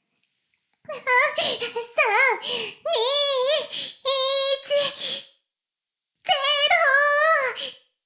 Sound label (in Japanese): シコシコ音声; 古き城のドラゴニート2